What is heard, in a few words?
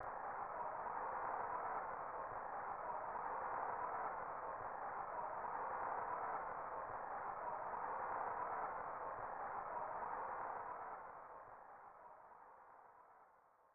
Crowd Murmur; snes; Bulls vs. Blazers